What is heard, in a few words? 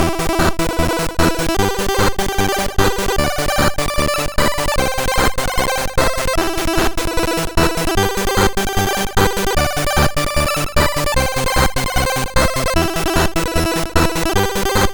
Tension Remix sid CSG8580R5